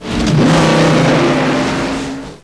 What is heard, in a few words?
Driveby; 3DRMDemo